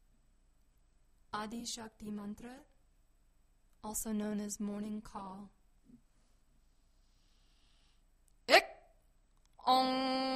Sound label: Adi Shakti Mantra Morning Call